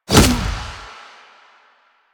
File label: Punch; Warframe; Kuva Lich; Finishers